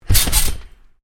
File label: Trampoline bounce heavy, Trampoline, bounce on: heavy bounce on canvas trampoline; Miscellaneous Sport Sound Effects; Stuart Duffield